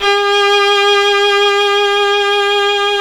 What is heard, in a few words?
Synclavier Strings; ILIO